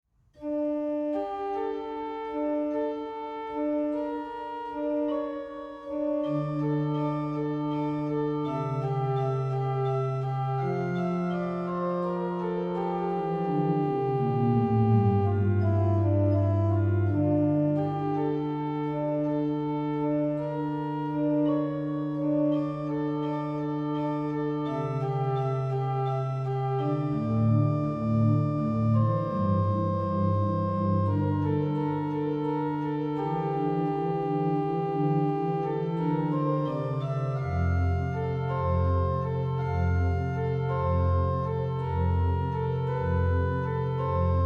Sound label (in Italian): piccola pastorale, organist; Carlotta Ferrari